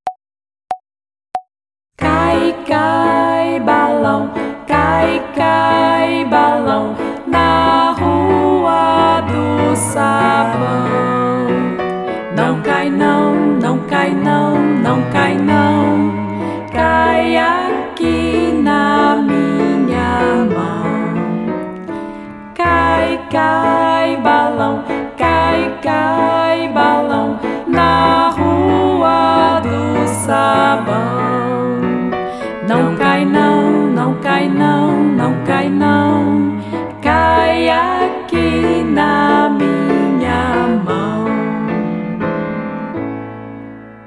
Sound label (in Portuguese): Vocal; Violino para os Pequeninos